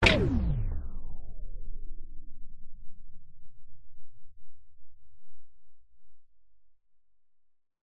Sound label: science fiction machine sudden switch off power down